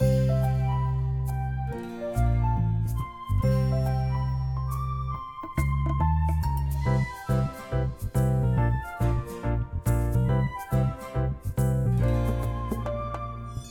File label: Mood Bright Medium